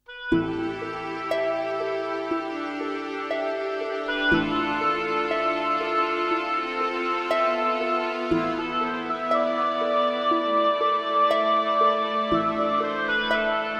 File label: dorian dirge